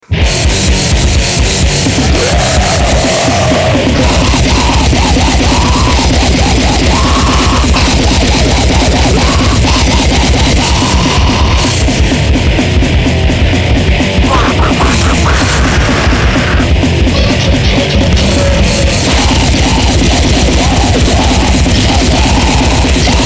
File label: ukulele